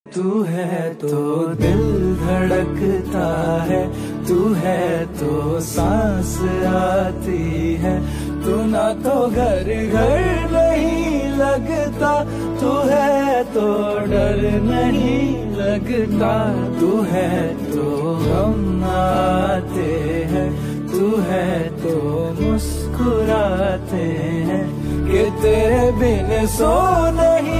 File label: song ringtone new song ringtone new assamese ringtone